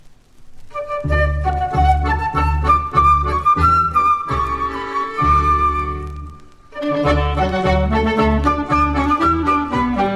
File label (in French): Quadrille, Angleterre